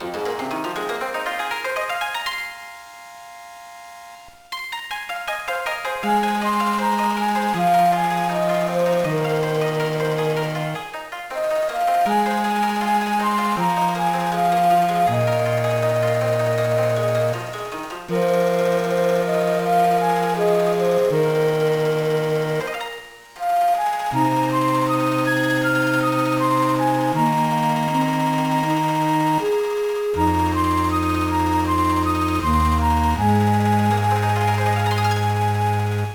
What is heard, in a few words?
BGM; Shanghai Advance; gba